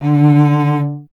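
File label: strings cello D; Miroslav Vitous; Solo Instruments VOL-1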